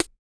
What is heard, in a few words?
SNARE, Male Perc, Vocal; Spectrasonics Vocal Planet; Jazz FX